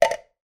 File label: foley food tin can empty down on tiled floor